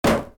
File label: Box Impact